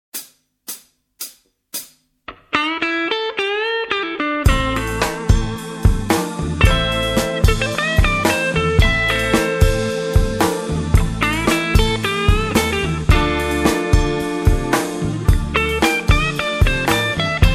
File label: blues jazz